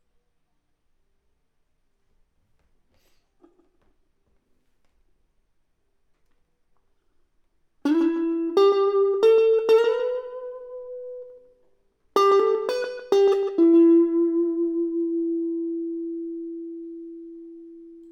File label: Elect Guitar